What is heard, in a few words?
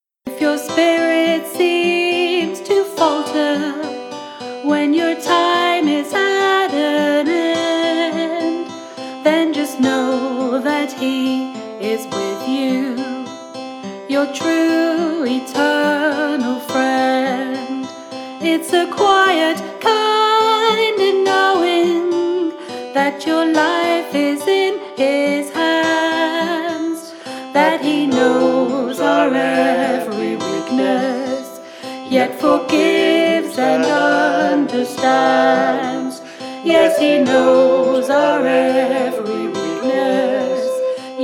quiet song